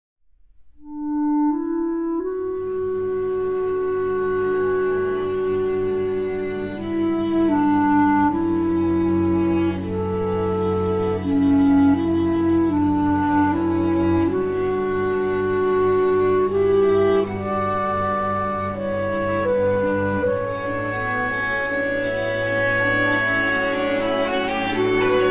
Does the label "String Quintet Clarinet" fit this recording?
Yes